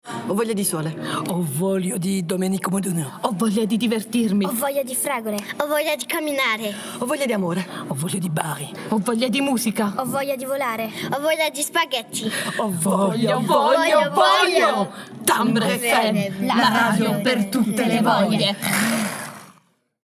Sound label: Jingle; Europa